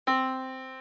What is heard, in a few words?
yamaha Disk